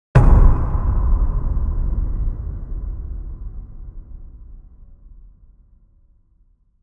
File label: Jumpscare, Horror; Horror Sound Pack